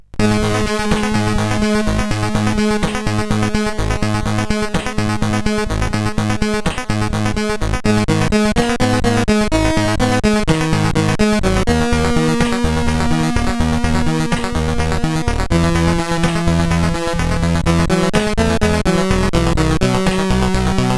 Attack One, CSG8580R5; sid

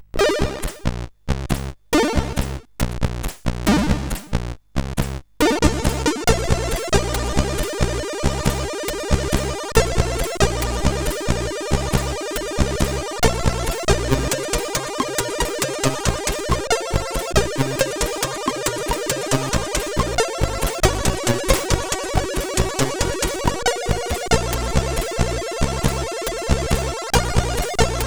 1988, CSG8580R5; sid

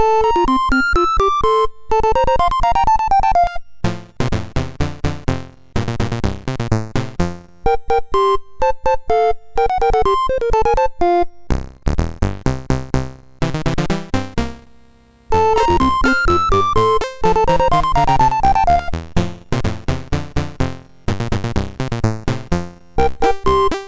MOS6581R2; sid

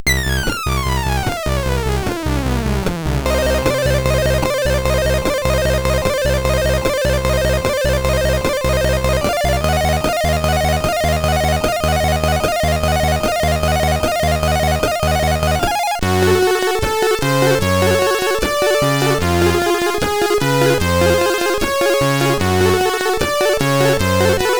sid CSG8580R5; Gremlins 2-The New Batch

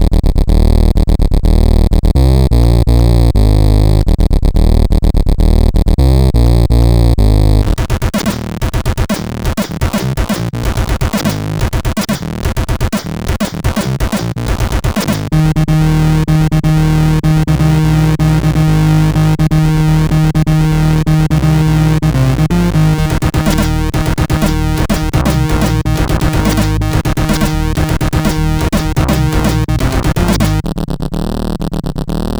sid CSG8580R5

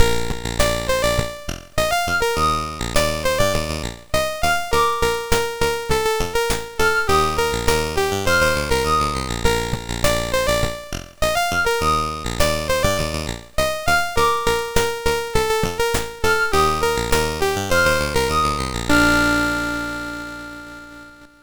sid MOS 6581R2

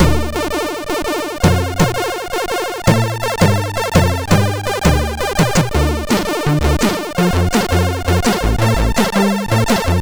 sid MOS6581R2; Mission

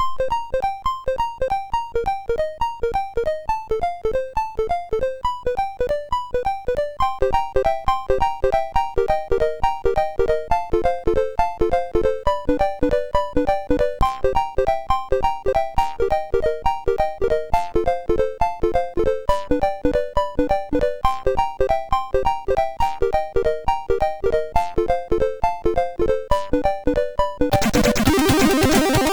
Renegade for Life, MOS6581R3; sid

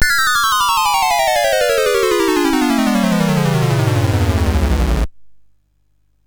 sid MOS 6581R3; GeeBee Air Rally

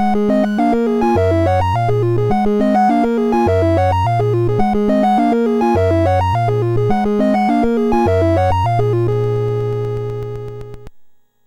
sid MOS6581R2

